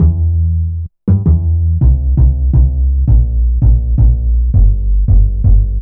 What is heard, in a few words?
Upright bass loop 165bpm A2, Drum&Bass; Total Drum&Bass